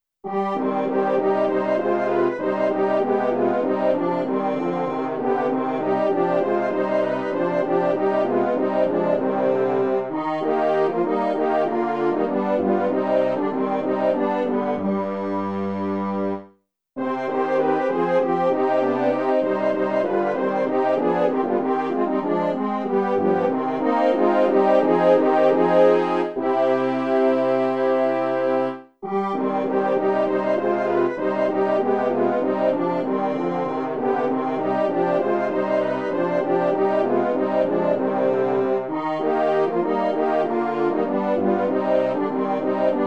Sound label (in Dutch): Koor by French Horns; Komt vrienden in den ronde